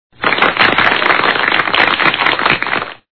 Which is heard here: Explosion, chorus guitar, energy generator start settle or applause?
applause